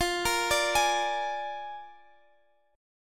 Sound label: A sharp 7th F